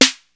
snaredrum, tr808

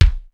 lo foot; sr16 bd

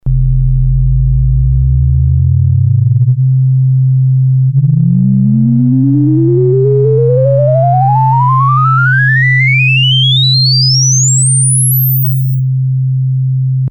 modulatr; nord3

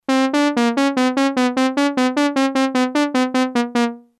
lfo env; nord3

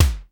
electronic kick; hr16

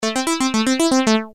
mono

